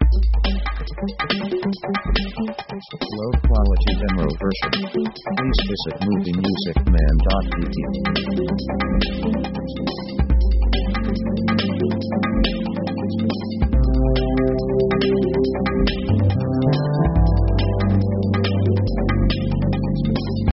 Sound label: pop Rhythm; synth themes